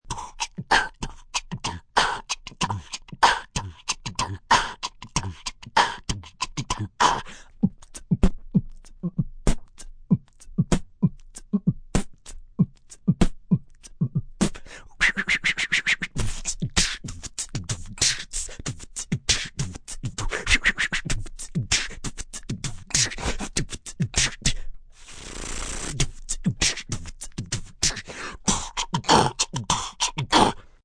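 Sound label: Beatbox, Vocal drums, rock beat (human voice); instrument; Singing (Sound Effects:Voice); VoiceBox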